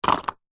leisure jigsaw pieces handful large drop into card box